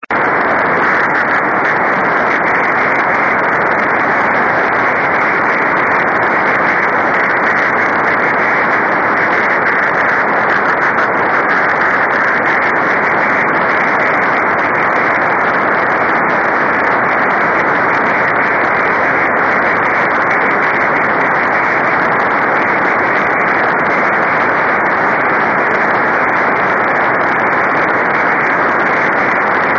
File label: 24G beacon; 雨、気温２１℃ 湿度８５％; レインスキャッタ; JH1UGF 横浜市; JN1AYV 茅ヶ崎市